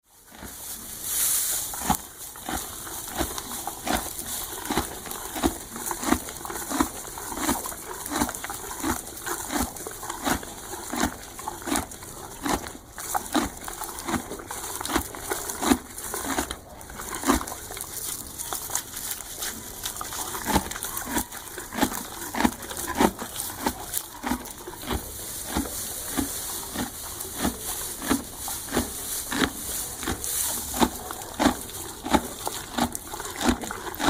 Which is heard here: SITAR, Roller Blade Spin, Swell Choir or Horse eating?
Horse eating